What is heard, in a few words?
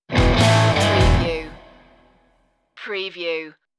delta sound stinger